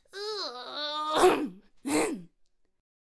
Swordcaster Attack Vocals

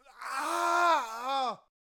Death Bringer Death Vocals

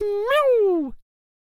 Chariot Attack Vocals

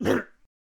Boxer Death Vocals

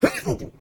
Banshee Death Vocals

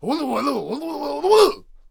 Ninja Attack Vocals

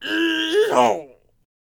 Attack Vocals; Ullr

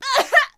Death Vocals

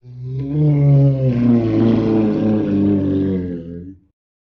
Ice Giant Attack Vocals

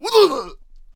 Ninja Death Vocals

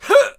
Archer Death Vocals

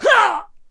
Clubber Death Vocals

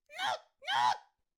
Fan Bearer Attack Vocals

